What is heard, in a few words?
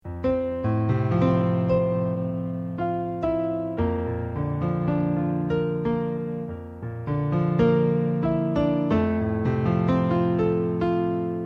f major theme, improvisations